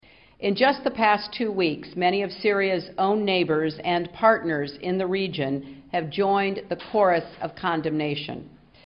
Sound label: chorus; Lucifer